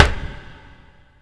CABINET VERB, percussive

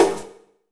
HIT 4 FX; Bolder Sounds - Granular

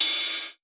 Main Room Cymbal; essential drumkit

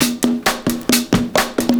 PERCS, 70 s Breakbeats

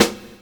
SD, snare, 70 s Breakbeats

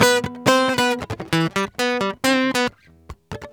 merengue, World Grooves; Best Service ProSamples vol.14; AKAI

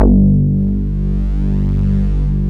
NEW WORLD B, Bass Synthetics; E-MU Producer Series Vol. 2 – More Studio Essentials

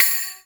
RV CYM

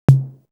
Tom, Drum One Shots; Coldcut's Kleptomania VOL1

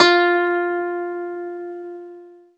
ZITHER, Alpine Volksmusik

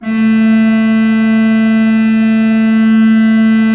A2, Cathedral Organ; ILIO; Propeller Islands Cathedral Organ

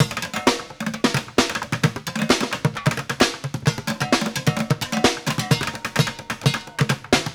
Street Groove, Ambient Grooves

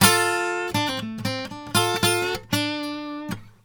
Blues Guitar Samples; ILIO; Fretworks; LICK

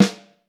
Vintage Snare Drum, 6.5X14

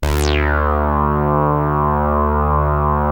SAW RES WET, SYNTH LAYER, Synth and Organ; InVision Interactive; Keith Emerson

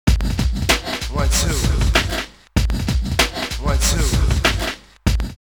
drumloops OldSchool 96; X-Static Goldmine

